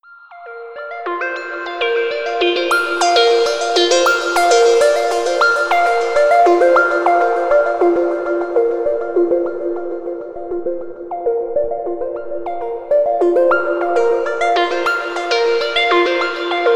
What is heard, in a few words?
upbeat